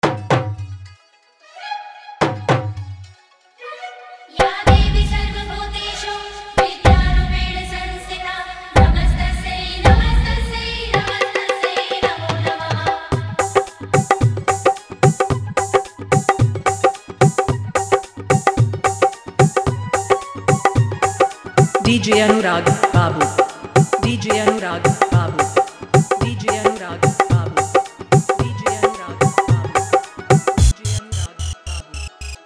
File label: Bhakti Dhollki Mix